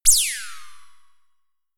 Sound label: notification; samsung Aleart